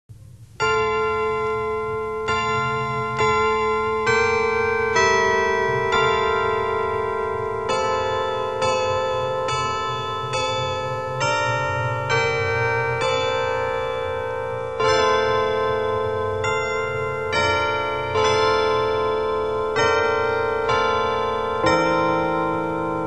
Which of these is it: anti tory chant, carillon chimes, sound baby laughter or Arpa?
carillon chimes